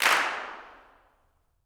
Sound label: CLAPS; Vocal Planet Vol1 Gospel; CLAPS+SNAP